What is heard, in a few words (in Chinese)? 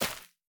垂根破坏声